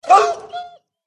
dog demon wound

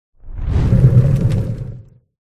golem

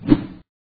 dodge

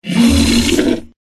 Pet Lizard Buff; varan

